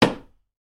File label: wood hit plastic